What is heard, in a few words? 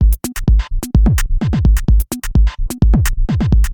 Tech Loop